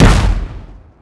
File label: shield; NanoFXv2; ExplosionFX; ExpShield